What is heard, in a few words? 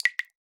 Drip; Drips